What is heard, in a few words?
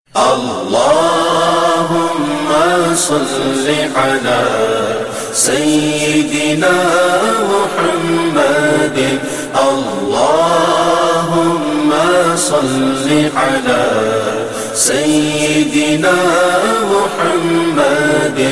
Allah Huma Salle